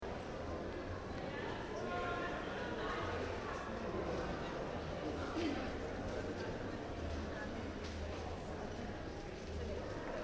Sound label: Shopping Mall, Interior: Ambience, Small, Uk; Stores and Malls; Stuart Duffield